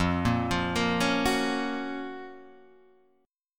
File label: Gb Major 7th F, guitar